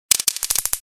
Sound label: Taser buzz electrical clicking stun gun